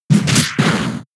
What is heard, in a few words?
Low Kick; pokemon sfx gen 6 attack moves xy oras